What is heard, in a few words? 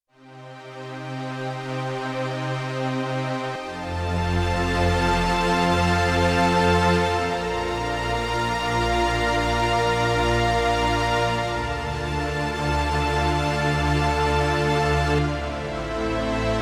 Slow Strings, Analog Strings, Yamaha TX16W